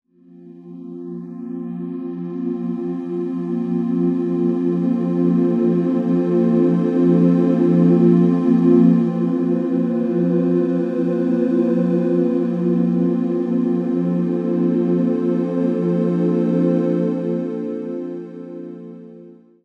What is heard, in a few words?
Ableton Wavetable Drifting Ambient Pad